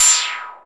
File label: FX A, ANALOG; Sound & Vision - Gigapack II